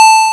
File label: Hard PCM Chip; chiptune